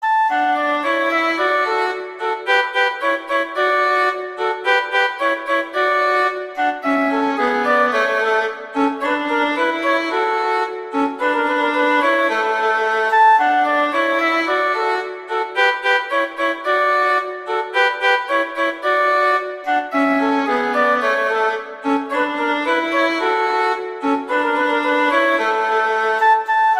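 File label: Duet, violin, viola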